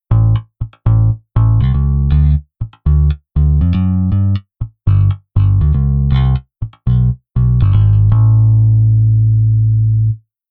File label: Bass Dur Off